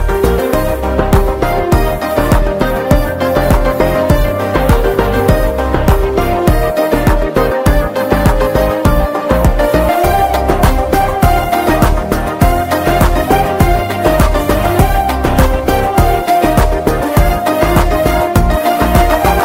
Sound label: BG Tone; from Bruce Lee Movie